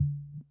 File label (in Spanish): tambor